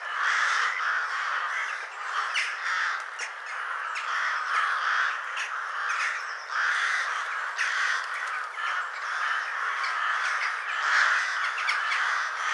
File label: Ravens